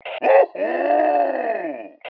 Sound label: WOOHOO